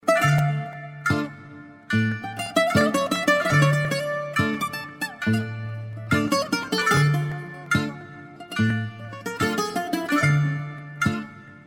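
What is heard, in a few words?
beautiful Greek music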